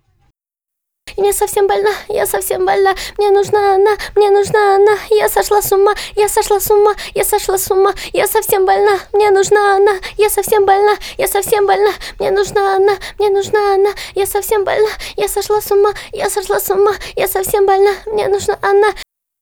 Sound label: Ab Lib, acapellas